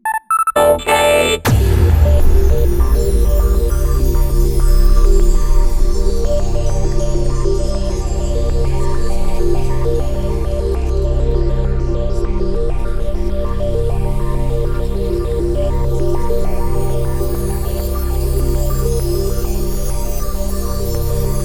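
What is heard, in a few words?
checkpoint teleportation SFX, msx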